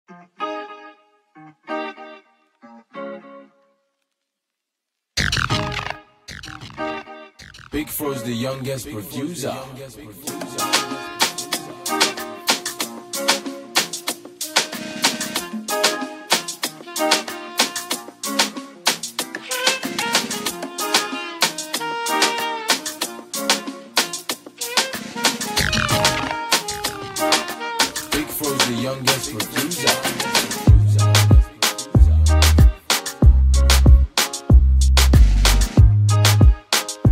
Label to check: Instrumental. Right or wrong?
Right